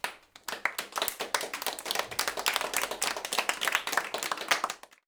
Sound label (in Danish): Let klap